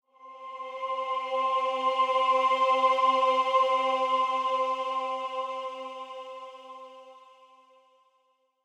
amb hymns tonal; gamerip; blackfaun

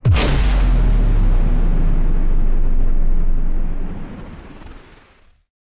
Low Cinematic Impact

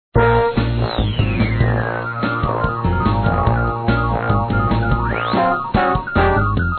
Win Game; froot loops black beaks treasure cove online gamerip 2006

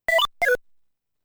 Sound of Hitting Enemy Character; Teki Kyarakuta Hitto Saundo

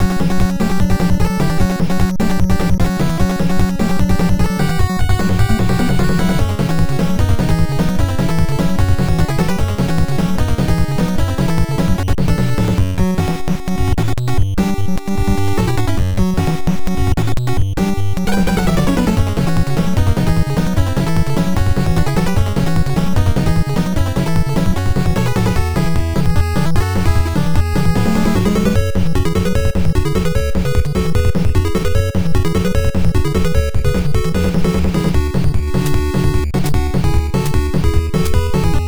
Enemy Alert; Snake's Revenge; NES

